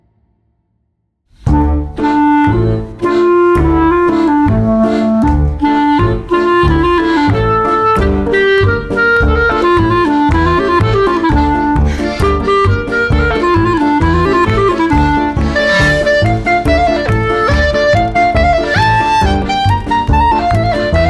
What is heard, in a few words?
Klezemer Style